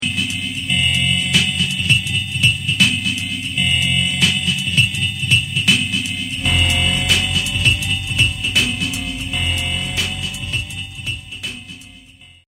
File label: Phase Drum